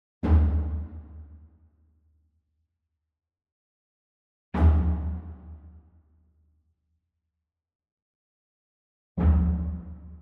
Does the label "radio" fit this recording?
No